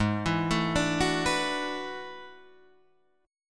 E 11th Ab

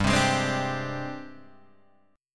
G sharp Major 7th Flat 5th

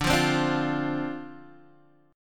D-Minor 7th D Guitar DADGAD, chord

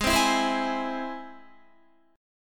Ab Augmented Ab Guitar; Ab+ strummed; JGuitar

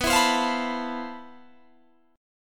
C Major 13th B

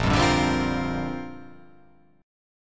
A sharp 6th Add 9th

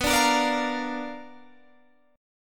B Minor Major 7th Flat 5th, chord

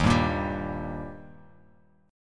Eb Major Eb down Bass Standard; strummed; JGuitar

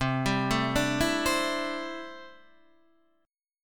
C 6th Add 9th C down Guitar DADGAD

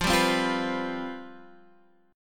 C Major 7th Suspended 4th F

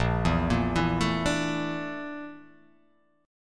Bb Major 7th Flat 5th Bb; BbM7b5 arpeggiated; JGuitar

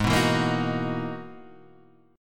E-Major Sharp 11th Ab

